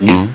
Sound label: glug